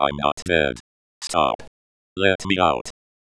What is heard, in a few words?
zombie; hawking